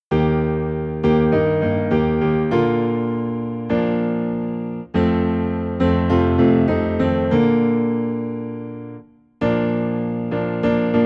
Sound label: Piano Low in B Flat, hymns; All for Jesus